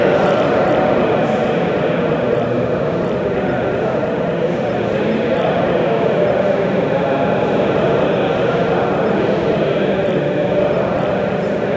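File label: medieval tavern background, clinking glasses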